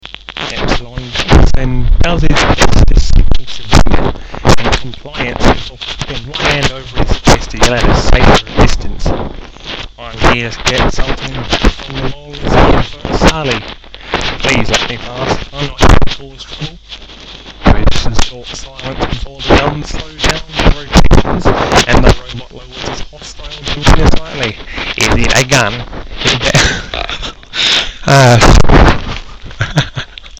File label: whoops